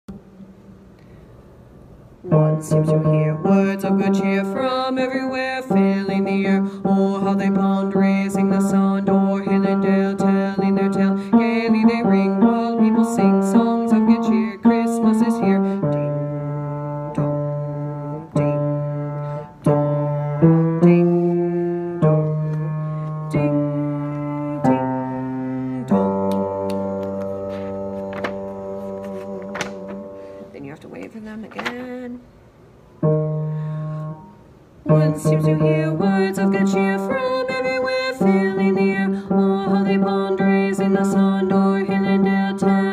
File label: bass part